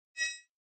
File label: Alert Beep Sci Fi